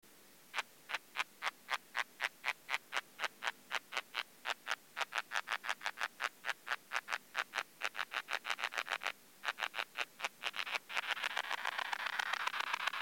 Poecilimon fussii lab recording 23°C bat detector at 40 kHz